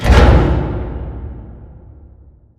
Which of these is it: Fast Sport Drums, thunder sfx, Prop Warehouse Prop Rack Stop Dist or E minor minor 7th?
Prop Warehouse Prop Rack Stop Dist